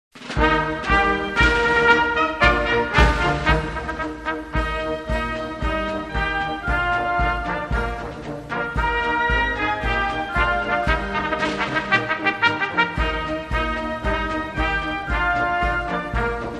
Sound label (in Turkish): Harbiye Mars Tufi